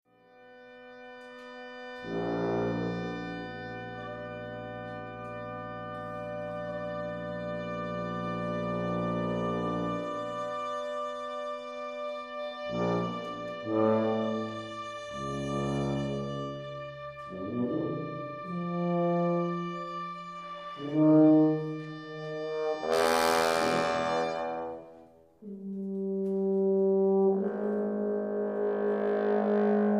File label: fugue